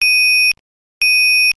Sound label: Nextel Ringer